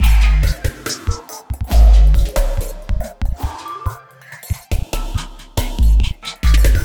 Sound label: 808 Loop; Sonic Foundry (Sony Creative Software); Esoterik Beatz